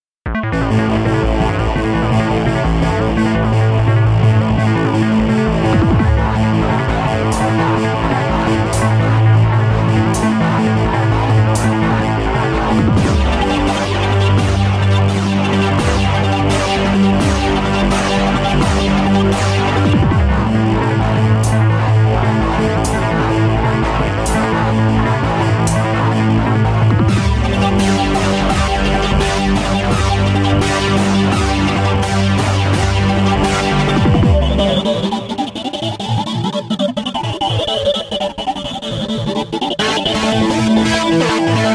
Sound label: Sentient Noises